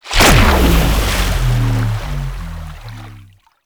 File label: Paint Deposit Start; Gameplay; Warframe; Shadowgrapher